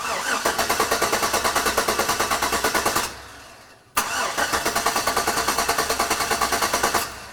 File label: cranks but wont fire up